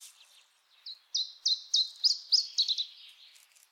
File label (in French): pouillot iberique